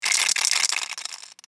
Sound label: spray can shake